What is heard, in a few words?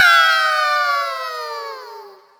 Kids Cheering